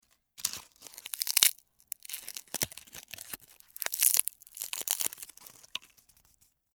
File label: nori